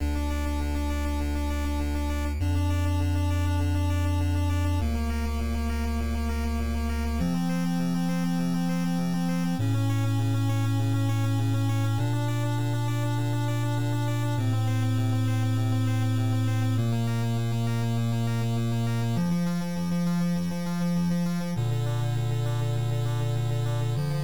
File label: 8bit slow